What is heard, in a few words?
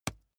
wood impact small lash soft